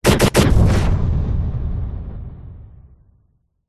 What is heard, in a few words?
science fiction cannon gun blast